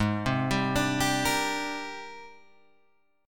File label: G sharp Major Sharp 11th, Guitar Open G